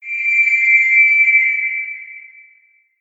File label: whistle warning